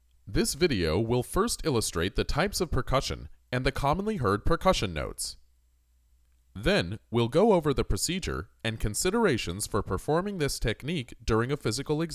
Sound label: Percussion